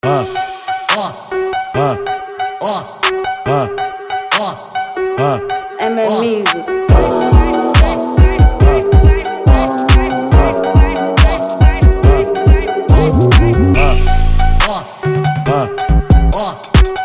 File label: brazil drill